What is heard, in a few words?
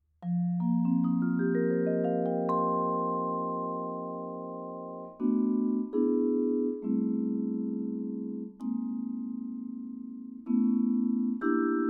vibra, vibraphone; benoit lavollee jazz vibraphone wand